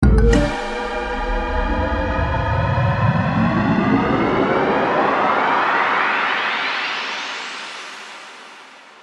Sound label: stop bonus; Book Of Queen